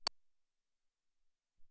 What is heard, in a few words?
Sqk Hat, Alesis SR-18